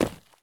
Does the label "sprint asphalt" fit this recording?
Yes